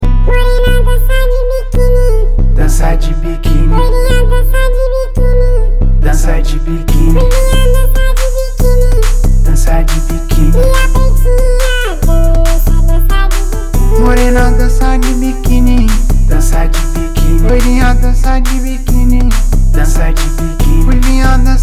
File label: hip hop